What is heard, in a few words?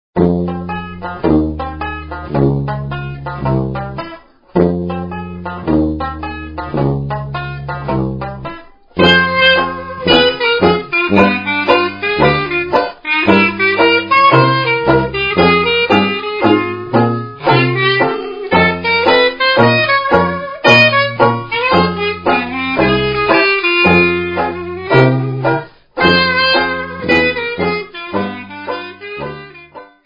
blues